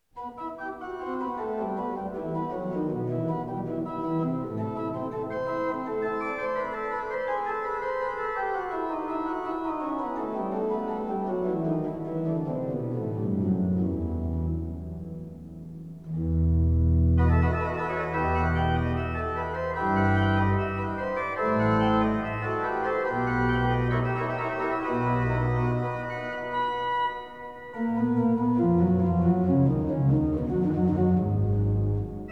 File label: Prelude, E minor, ORGAN; BWV 533; Prelude and Fugue in E minor; Bach; Organ Works